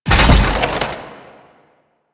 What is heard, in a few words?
Motorcycle Collision Fast; Vehicles; Warframe; Motorcycle Ninety Nine